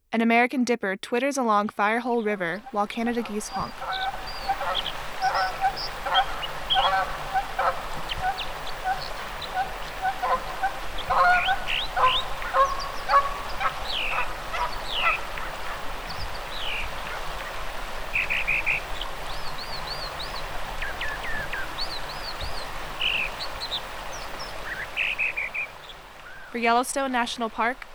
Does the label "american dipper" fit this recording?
Yes